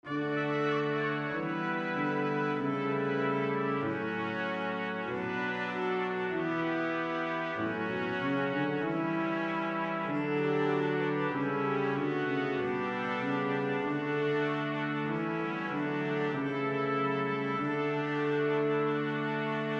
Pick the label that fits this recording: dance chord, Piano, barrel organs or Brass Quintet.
Brass Quintet